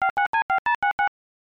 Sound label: Phone; cobra mission panic in cobra city; ibm pc at